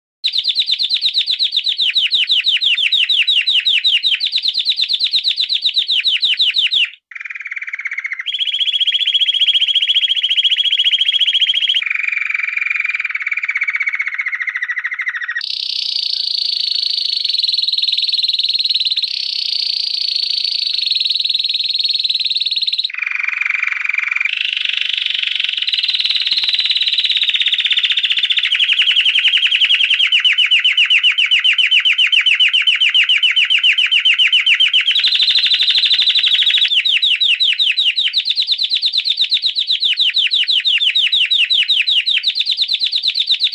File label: Canary, Bird Songs